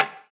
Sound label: Percs